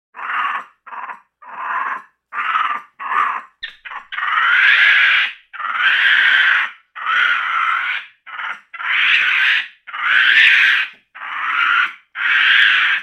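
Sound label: Monkey Capuchin Growl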